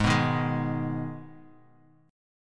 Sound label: Ab Minor Ab down; Abm strummed; JGuitar